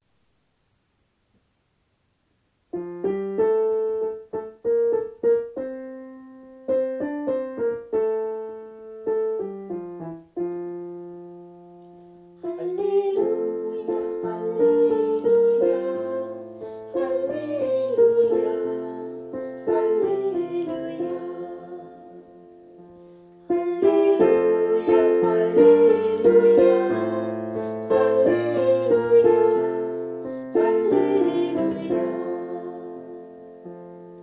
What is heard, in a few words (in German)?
halleluja; erstkommunion 2024